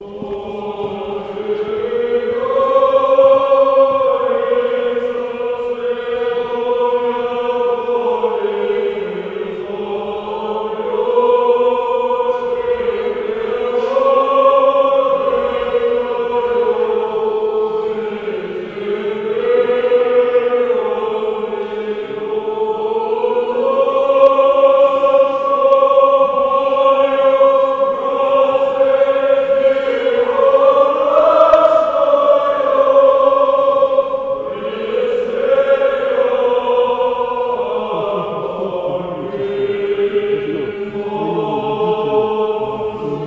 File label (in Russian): Рогожский хор 5 глас ирмос 6 песни